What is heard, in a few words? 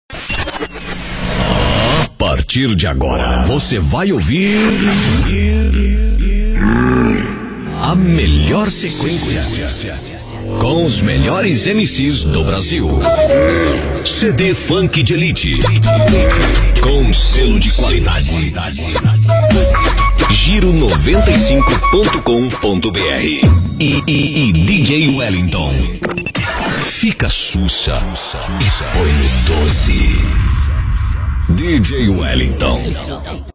Funk